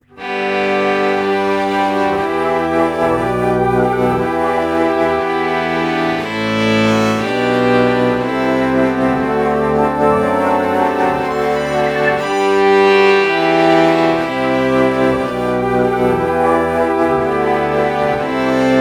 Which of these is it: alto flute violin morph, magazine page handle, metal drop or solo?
alto flute violin morph